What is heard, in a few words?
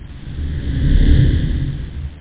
huuuh